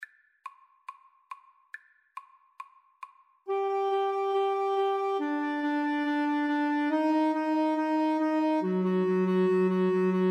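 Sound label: mozart symphony 25 3 clarinet karaoke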